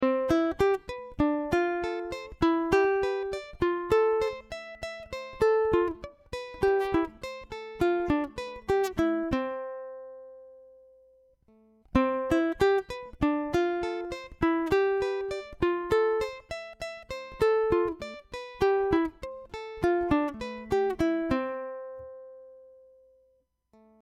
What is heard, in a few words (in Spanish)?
C Escala Back